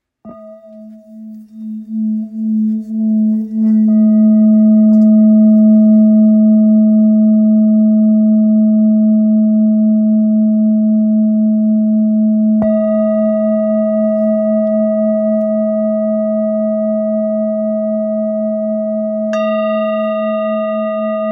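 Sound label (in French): Bol fullmoon la, bols chantants tibétains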